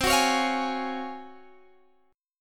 G sharp 13th C

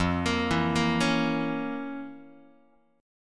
F Major 7th Suspended 4th Sharp 5th F, Guitar; FM7sus4#5 arpeggiated; JGuitar

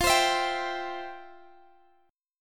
F Major 7th Suspended 2nd F

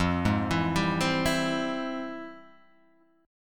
F Minor Major 9th Guitar Half Step Down

